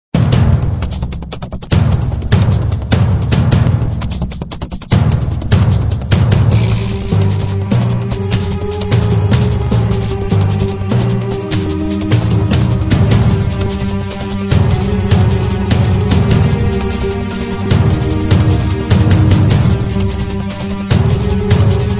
Techno

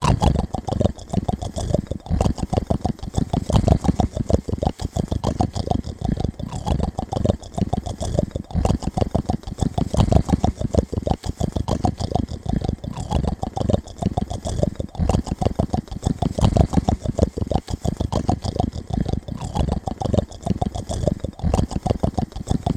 Mouthed Pig Motor Sounds

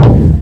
Circle Beat

